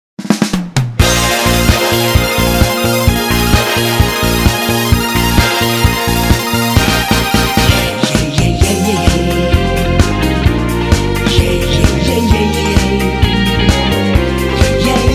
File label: comical